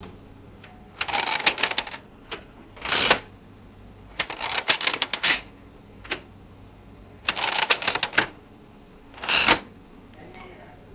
mechanical ticket machine setting clicks

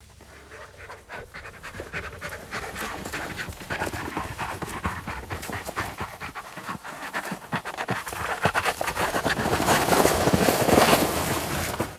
dog sled passing by on snow; animals

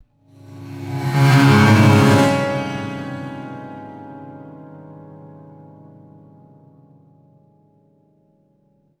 eerie prepared piano hit and glissando; designed

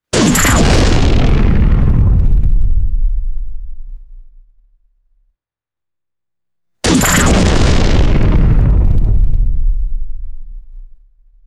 squeaky laser bolts with rumbling decay

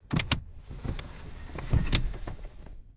car seatbelt unbuckling and retracting; vehicles

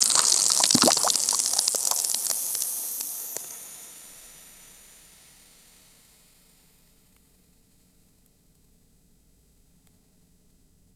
intense carbonated liquid fizzing and bubbling; food-drink

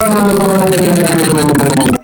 harsh distorted time stretched digital sweep; designed

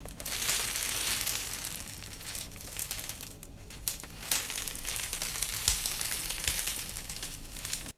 dry leaves dropping and scattering; vegetation

